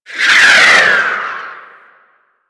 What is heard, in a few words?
Plunge Ability Angry Proj By; Enemies; Abilities; Warframe; Duviri; Duviri Dax; Dax Knight